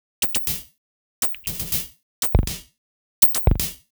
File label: Odd Glitch, 120 BPM, Percussion